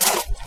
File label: gravel footstep